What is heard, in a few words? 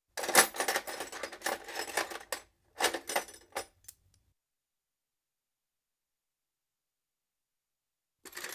Wrench Removed From Toolbox; Construction; BBC Sound Effects Library